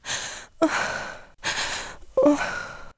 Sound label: death breath; urbanchaos